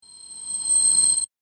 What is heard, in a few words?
Ramp High Pitch; Ascending Sound Effects; SoundEffects-BlastWave